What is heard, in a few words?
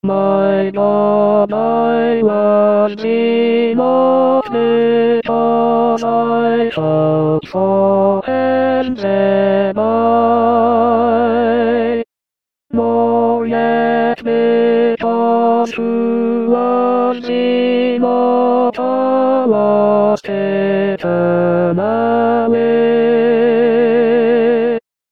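Hymn tenor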